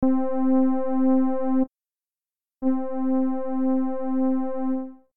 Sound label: amplifier comparison with and without envelope; korg m3; an introduction to the korg m3 sound engine